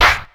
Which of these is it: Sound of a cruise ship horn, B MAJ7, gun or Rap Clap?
Rap Clap